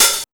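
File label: HH open hi-hat, Fresh Disco House; USB Soundscan vol.20 - Fresh Disco House I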